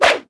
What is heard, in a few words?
woosh